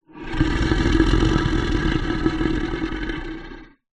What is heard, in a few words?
horror monster warning growl; zapsplat